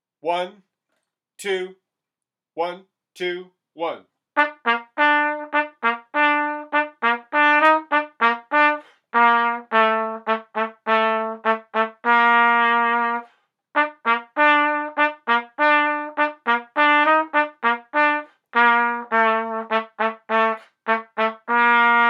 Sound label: Military March; B part; virtualconcert